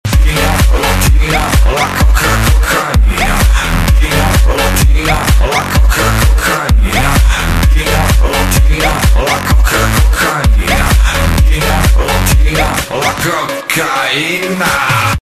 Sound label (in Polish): klubowe zm